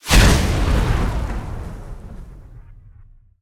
fireball, ghast; mob; Minecraft; Votive World

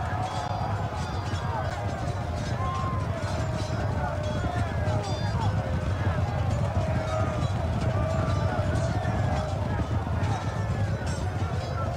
battle; Minecraft; Votive Content

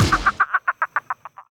carve, pumpkin; Minecraft; Votive World

